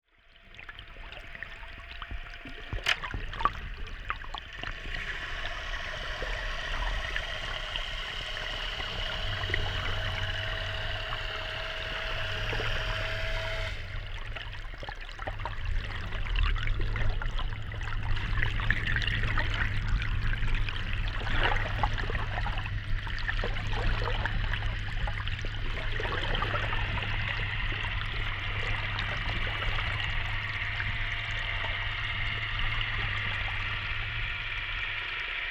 gabarre Fayrac, En suivant une gabarre et son moteur sous l’eau; Vézac

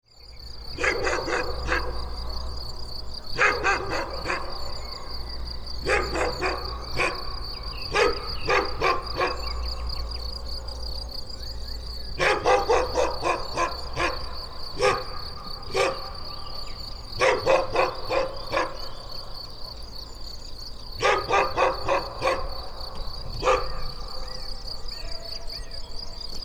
chien mechant

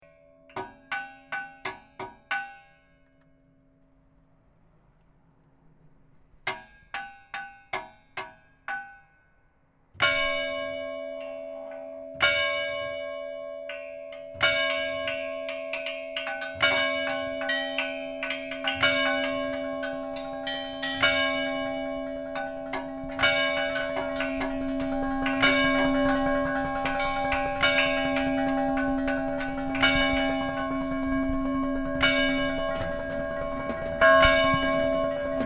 cloches; vézac

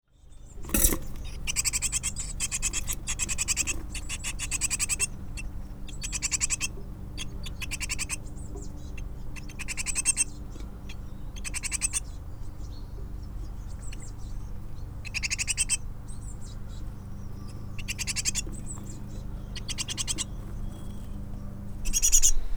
mésange nid, Un nid de mésanges charbonnières dans un pot à lait en alu.; Oisillons au nid; Vézac

